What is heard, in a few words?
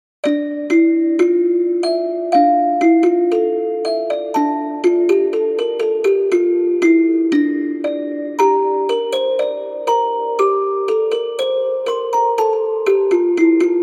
Kalimba Spacey